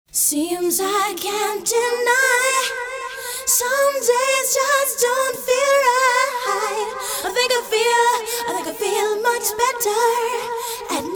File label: B3 Accapella; Defected